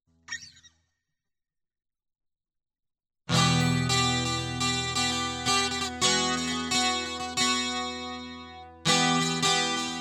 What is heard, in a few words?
Guitar